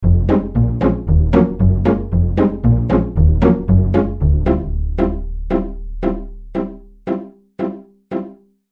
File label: Pizz Strings